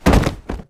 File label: flop